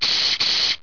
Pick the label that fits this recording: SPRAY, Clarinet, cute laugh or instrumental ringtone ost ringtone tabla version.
SPRAY